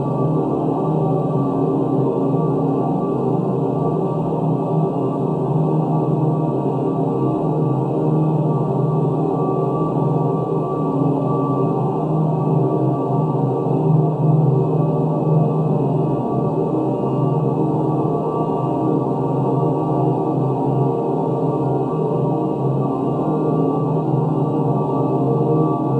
drone; Droning Sound Effects

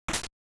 trapdoor; 8-bit Platformer SFX

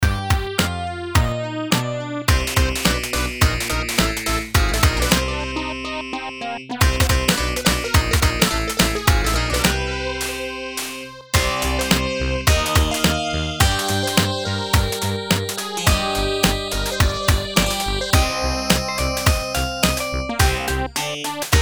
Galactic Funk

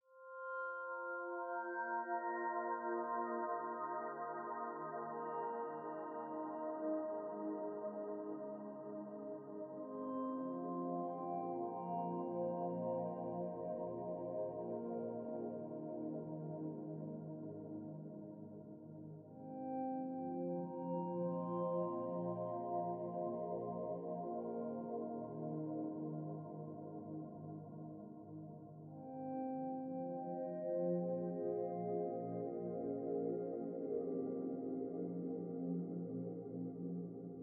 a friendly ghost minimal

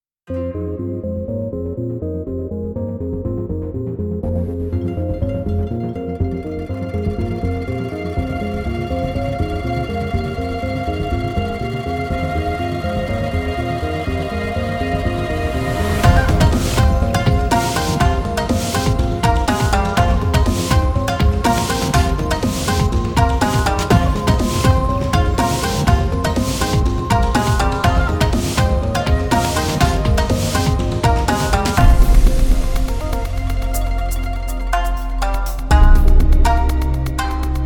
japanese hacks

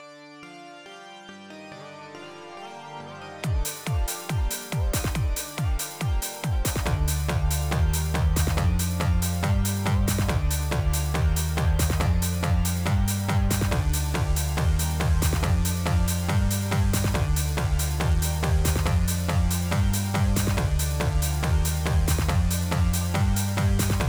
Moving And Shooting, Made With LMMS; party

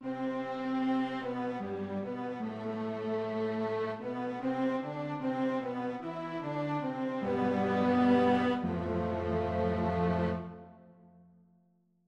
lose music

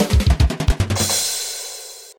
drums

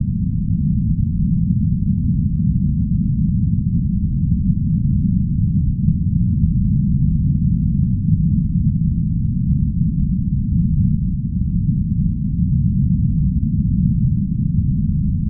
underwater or space engine

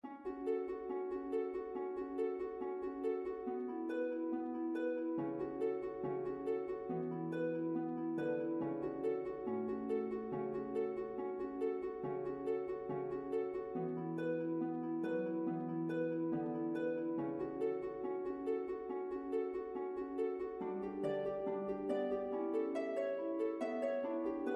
Harp

